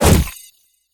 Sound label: Abomination Melee Magical; Kilta